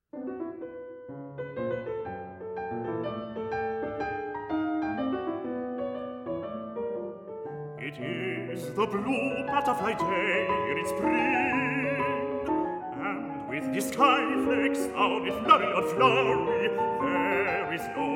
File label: Baritone, Piano